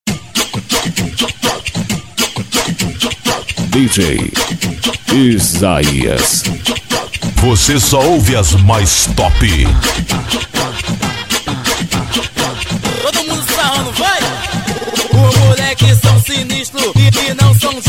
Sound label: funk bass automotivo